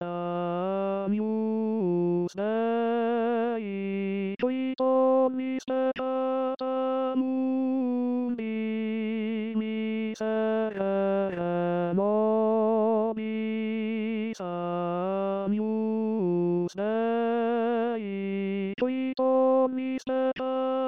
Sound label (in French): tenor; agnus messe de st jean